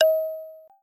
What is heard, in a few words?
light pitched-down